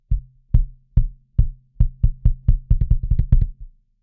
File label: kick; Gem EQP